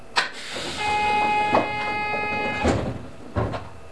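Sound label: Door Close Left, Train; OpenBVE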